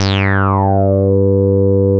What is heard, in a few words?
G3, Bass; CRANDLE; E-MU Producer Series Vol. 6 – Analogia Project (CD 1); Boom da Bass 1